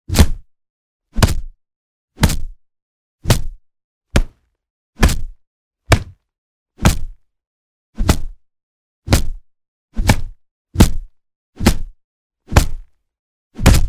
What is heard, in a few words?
Boxing Punches